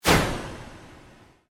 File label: Created sweep pulse quick; Sweepers; Sound Design; Various sweeps, pulses and drones; SoundEffects Foundation2